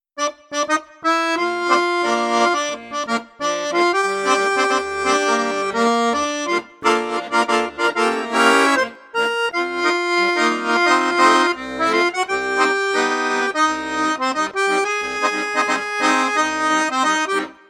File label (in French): registre basson flute piccolo, accordeon; pigini star jazz